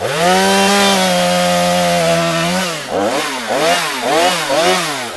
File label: Chainsaw Use; CastleMiner Z; Xbox 360